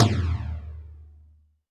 Tom Lo Flange, Alesis D4 drum machine

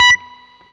BLU POP, Guitar & Bass; 90 s Sample CDs; Quantum Leap - Guitar & Bass

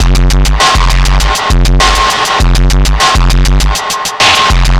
Noise Beat; Drums & Drones

